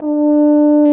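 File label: brass baritone; Roland - LA Composer vol. 1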